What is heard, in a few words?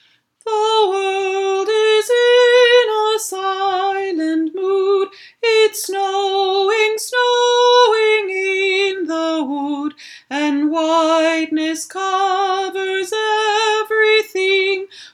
Winter Song